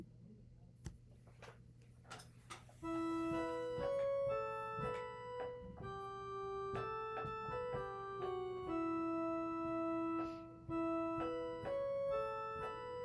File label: tune; Noel Nouvelet